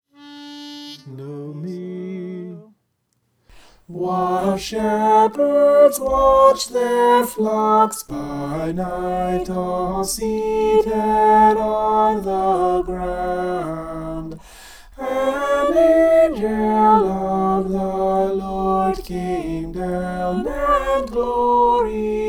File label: soprano